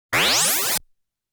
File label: Item Collect Sound; Zero Wing